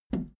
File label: video tape player plastic fast forward release